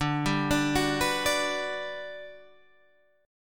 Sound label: G Major 7th, D